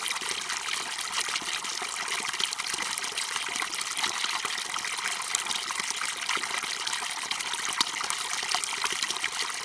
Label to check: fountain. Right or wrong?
Right